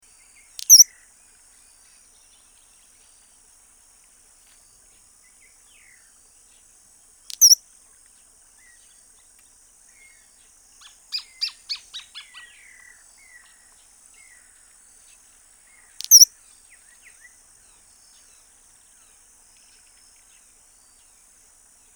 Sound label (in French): Moucherolle tête blanche